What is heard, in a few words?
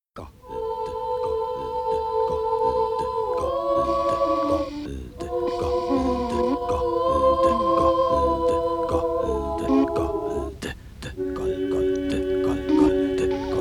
Radio Nova Jingle; Nova 25 ans - 1988